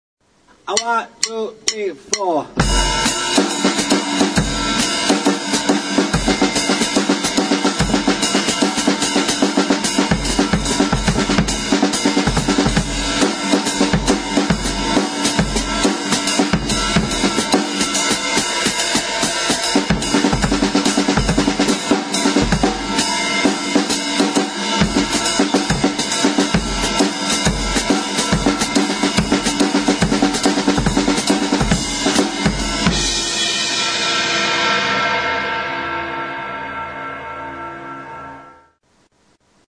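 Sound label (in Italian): jazz drum method; metodo per batteria jazz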